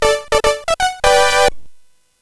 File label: Fast Sfx, CSG8580R5 sid